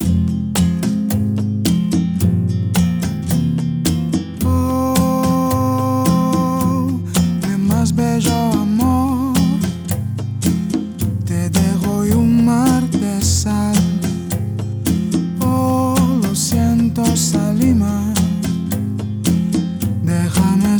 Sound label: Cafe Latino